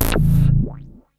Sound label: dance, bass; Ueberschall; Techno Trance Essentials